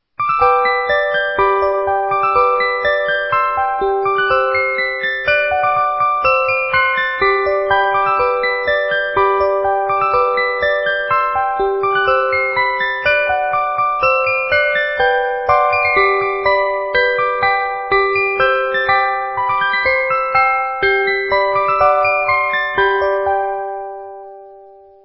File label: standard jingle bells, xmas tree